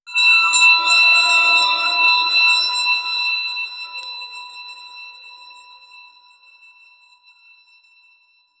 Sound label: a sparkling discovery sou, treasure